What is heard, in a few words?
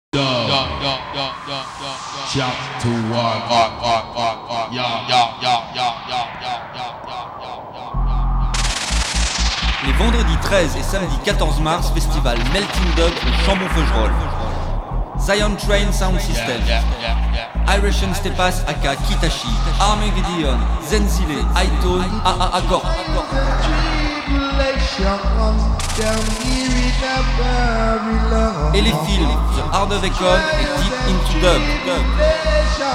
jingle